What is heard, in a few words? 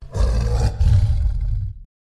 symbol tiger; games; Aztec Gold Megaways